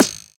hit Forth Far; HeavenStudio; air Rally

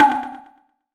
explode Bomb; HeavenStudio; fireworks

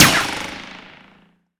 shoot; HeavenStudio; builtToScaleRvl

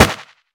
toss; HeavenStudio; meat Grinder

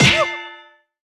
miss; HeavenStudio; clapTrap

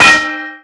pan slice; HeavenStudio; dog Ninja

